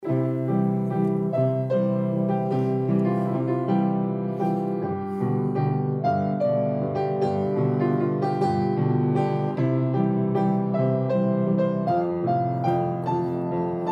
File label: Piano Rendition